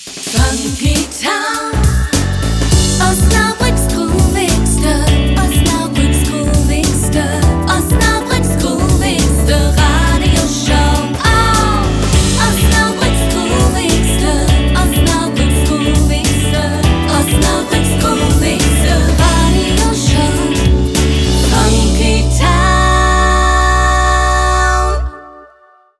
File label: Jingle Long Slogan 102bpm